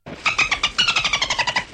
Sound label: dolphin; minecraft; technic